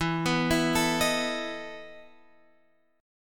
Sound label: E Major 7th Suspended 4th E down